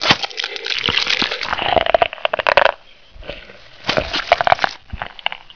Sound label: bite, barnacle; gearbox